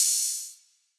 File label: Open Hat, Drum Kit; Nests